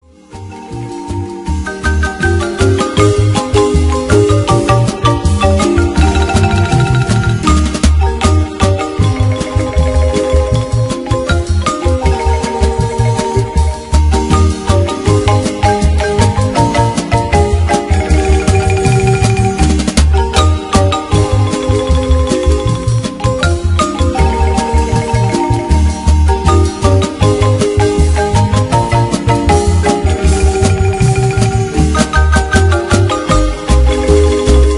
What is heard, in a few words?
MARIMBA